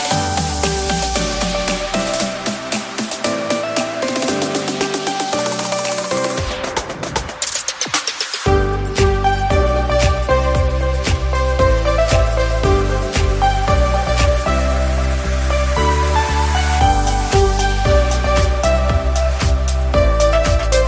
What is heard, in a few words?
Vivo Ringtone; Vivo T2 (India)